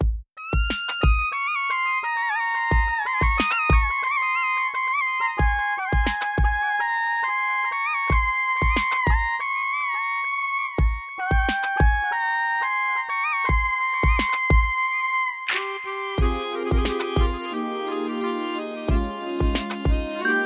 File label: Hindi Sad Melody